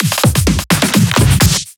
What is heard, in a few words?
EDM Drum Fill; FL PRO